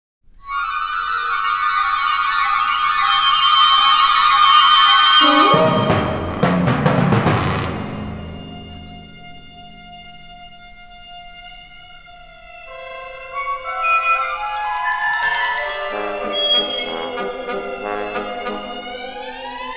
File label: introduction and tarantella for chamber orchestra